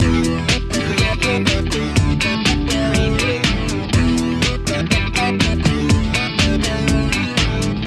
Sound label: disco loop